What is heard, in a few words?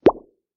boom